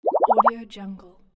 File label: bubbles rise up